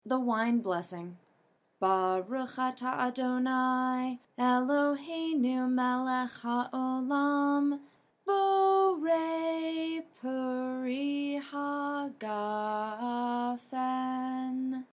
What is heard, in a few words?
Jewish Blessing Over Wine Kiddush; Friday Night